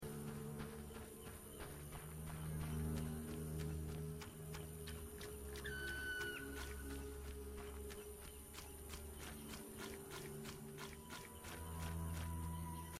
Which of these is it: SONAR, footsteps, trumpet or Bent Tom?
footsteps